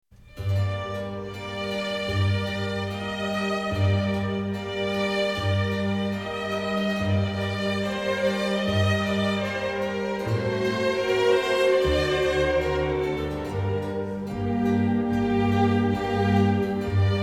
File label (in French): sinfonia sol mineur; albinoni